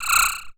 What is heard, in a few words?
tech fx; Best Service; Hyper Dance; EFFECTS